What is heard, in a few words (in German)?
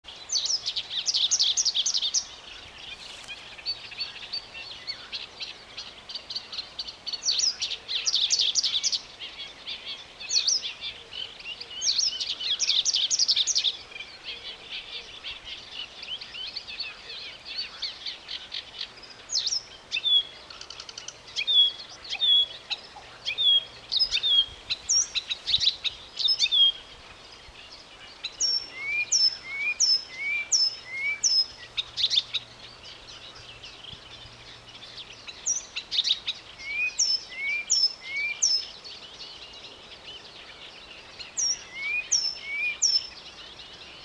buschrohrsaenger